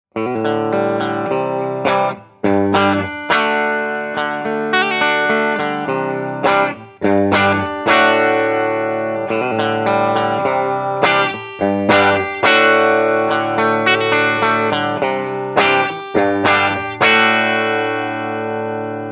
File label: Powerplus bridge